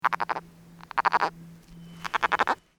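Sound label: Rana cascadae release, frogs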